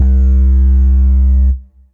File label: Fill It Up Bass; Synth